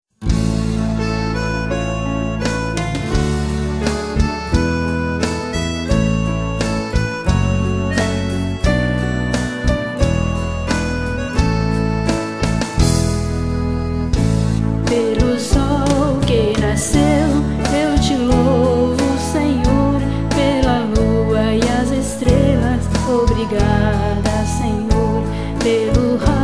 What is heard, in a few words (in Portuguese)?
agradecimento e louvor